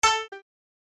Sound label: Button Note A